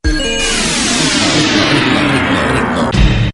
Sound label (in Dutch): reclameopener; Ritmo